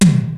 DRUMS; Rubber Chicken Software Sample Bank

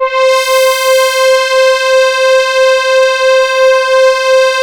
MONO PAD; PADS

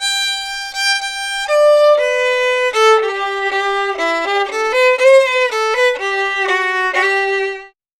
Fiddle, Appalachian

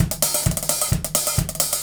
Straight Kit, World Pop Beats; Sonic Foundry (Sony Creative Software)

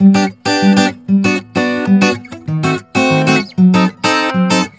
GUITAR SAMBA

